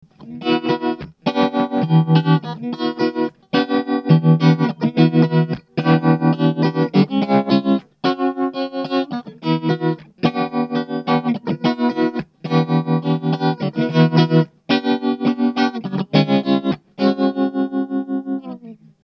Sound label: maxspeed maxdepth, Tremler, tube